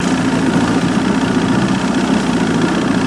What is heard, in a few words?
115 hp Evinrude Boat Drive Loop Driving, On Board, Mono